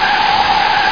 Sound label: ski; NewSurfs